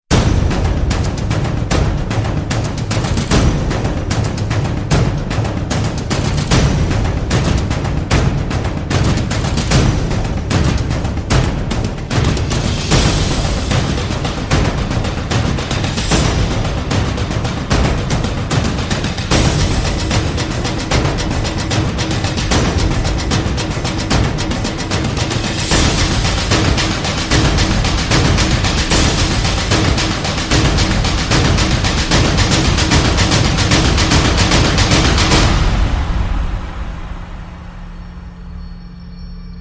Drums

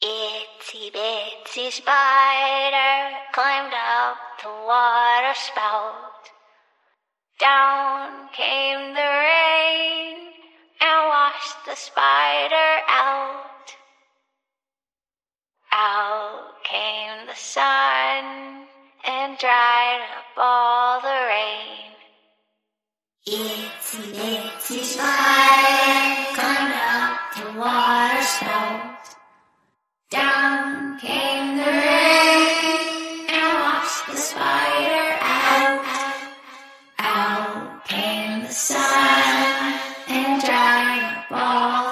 Itsy Bitsy Spider Horror Vocals Only

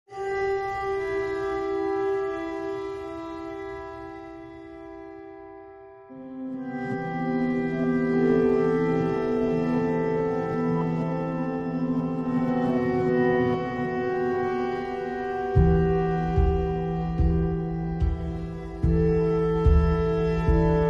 No Wind SFX No Piano Melody No Strings

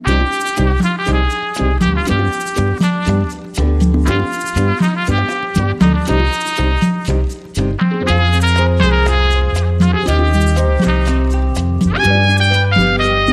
Ballroom Tango

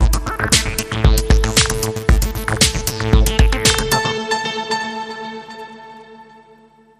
Pulsing

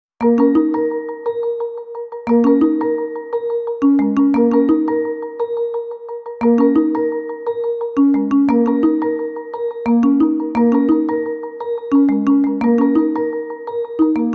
Driving Mallets, Underscore

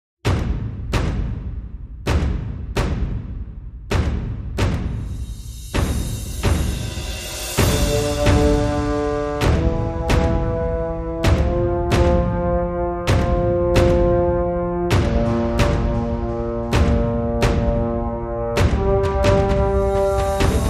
Drums and Brass

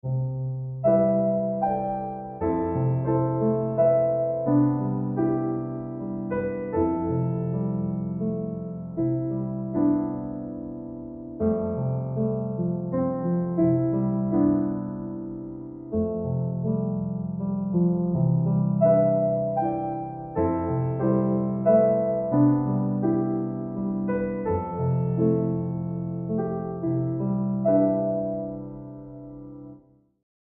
Solo Piano

